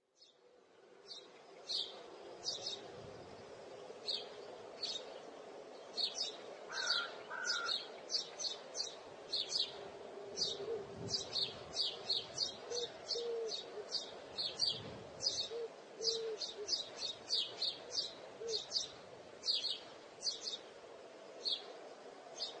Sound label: Bird Chirping Sound Effect